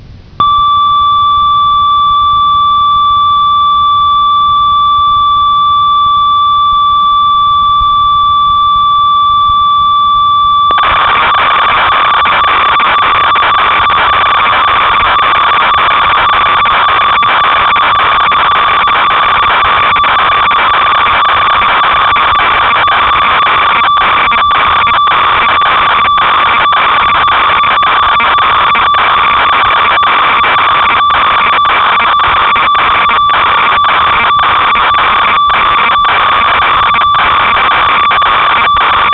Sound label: ob6, synth